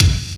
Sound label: JD800 Dance Card Drums